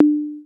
Clave; 300 Drum Machines; E-MU MoPhatt

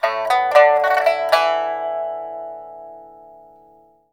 PIPA PHRASES; Spectrasonics; Heart of Asia

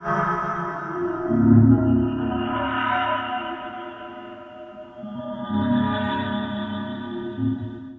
Spectral Tones; Sonic Foundry (Sony Creative Software); Loop Noir

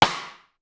Rim Up Fast, Pitch Env Various, Clavia DDrum; Clavia DDrum-AT

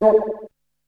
Perc; ZERO G - Datafile DISC 2

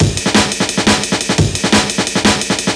DNB BREAK; m8 breaks

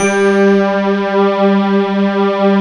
D50 Stak, Roland

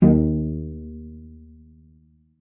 cello pizz D#2; 90 s Sample CDs; Xsample VOL-5

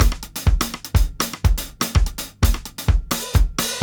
125 X STICK FILL, DRUMS LOOPS; BASEMENT DRUMS VOL 2

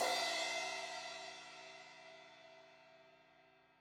Crash 16in edge, Cymbals; Deeply Sampled Drums

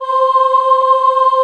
VOI CHOIR; Sound & Vision - Gigapack I CD 2

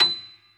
pno B6, Piano Grand; Retro Sampler; Piano Grand PF St

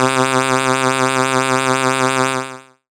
Dance Synths, Acid Bubble, c

